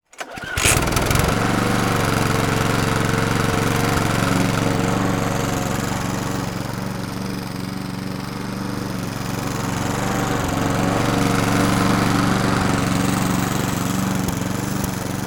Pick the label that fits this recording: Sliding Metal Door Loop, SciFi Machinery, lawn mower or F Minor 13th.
lawn mower